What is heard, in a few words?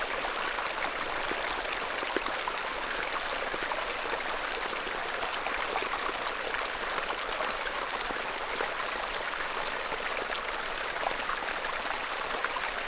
water stream small calm close; sonniss gdc